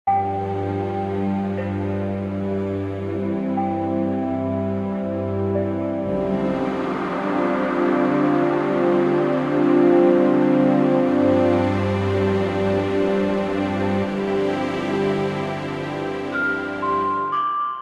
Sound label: Defeat Loop; Temple Run Treasure Hunters; android